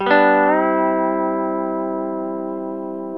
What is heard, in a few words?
Pedal Slides, G TO C